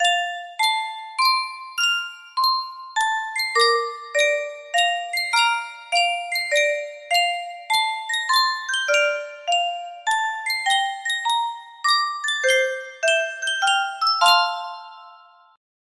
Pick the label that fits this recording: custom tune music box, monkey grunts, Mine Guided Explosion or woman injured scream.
custom tune music box